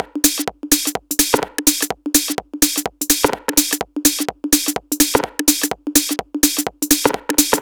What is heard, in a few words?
126BPM Connectors Drums, TechHouse; Vengeance Samples; Bonus TechHouse Kits